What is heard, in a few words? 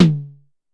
Drum X Snare; Pearl Drum-X